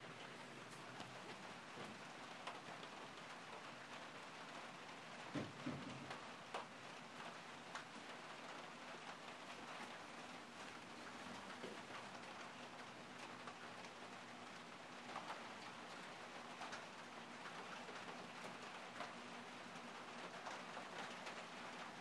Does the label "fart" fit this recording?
No